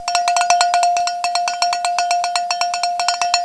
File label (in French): cloche